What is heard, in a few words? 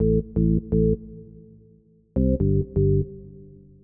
Organ Bass (125BPM), retro house